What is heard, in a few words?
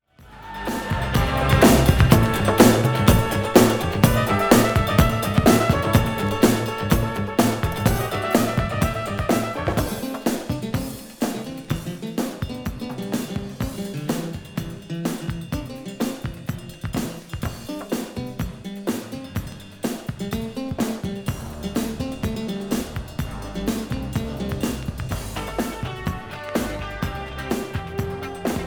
GONG